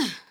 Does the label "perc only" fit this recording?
No